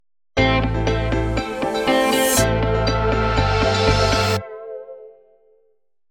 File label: JINGLE